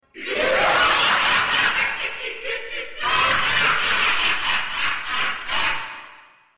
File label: laugh